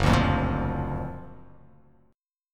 F sharp Augmented Major 7th, down

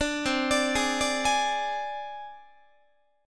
C Suspended 2nd Sharp 5th C Guitar; arpeggiated; 4A,4E,5A,5D,5G#,6C#; JGuitar

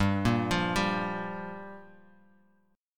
G Minor 7th

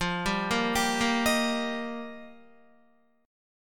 F 7th Suspended 2nd Suspended 4th, Guitar; F7sus2sus4; arpeggiated

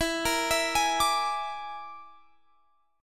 Bb 7th Flat 5th E

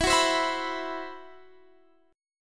C Major 7th Flat 5th E

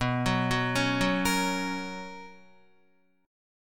B Major 7th Suspended 2nd Guitar, chord; BM7sus2; arpeggiated; JGuitar

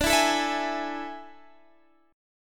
A Major 7th Suspended 4th D

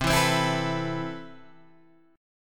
C Major 13th, Guitar Open G, chord

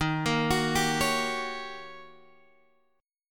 Eb 7th Sharp 9th, Eb, Guitar, Open G; arpeggiated; x,8,8,11,8,11

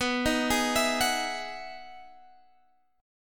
E Major 9th B, chord

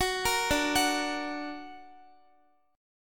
D Augmented D down Guitar; D+ arpeggiated; tuning 4E,4A,5D,5G,5C,6F

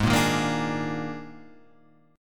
Eb Major 7th Suspended 4th; EbM7sus4/Ab strummed

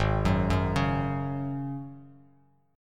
G sharp 7th Suspended 4th, Bass Standard; G#7sus4 arpeggiated; 4,9,4,6; JGuitar

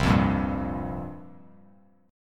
C 7th Suspended 4th, Bass Standard; C7sus4 strummed

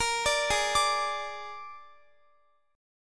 Ab Suspended 2nd Flat 5th Ab, Guitar; Absus2b5; arpeggiated; chordshape; x,x,22,19,20,22

